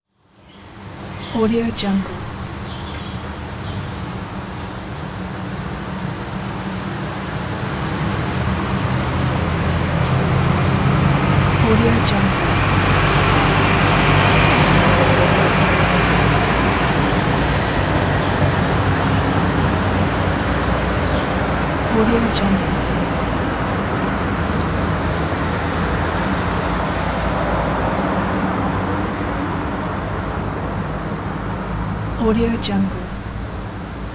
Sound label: city traffic and ambience